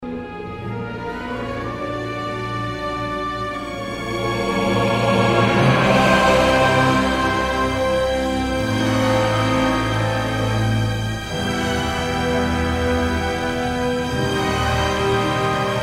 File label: Solo